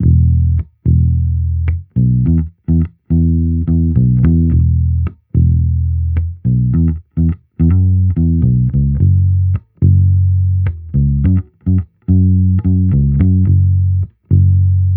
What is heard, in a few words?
Amped loop 107 E, Electric Bass Loops; Slate Digital BASSHOLE Sample Pack